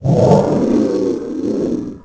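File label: scream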